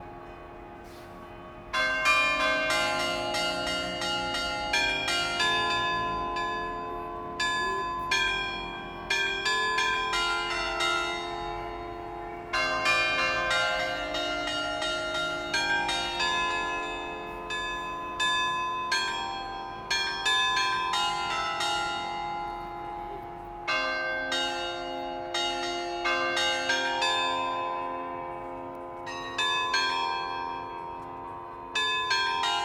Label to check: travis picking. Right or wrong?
Wrong